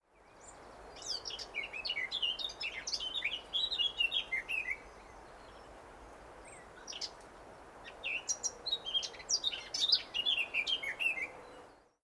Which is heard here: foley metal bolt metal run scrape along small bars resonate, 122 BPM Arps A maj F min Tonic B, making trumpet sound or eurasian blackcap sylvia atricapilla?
eurasian blackcap sylvia atricapilla